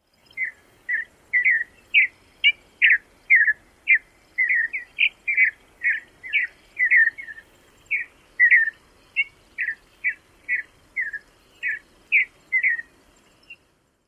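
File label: flight or dawn song, Mountain Bluebird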